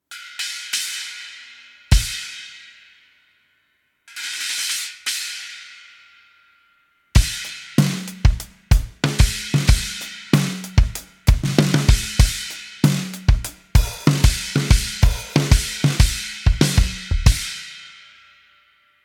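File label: 12 AA Mini Holy China, cymbal; Sabian; Brilliant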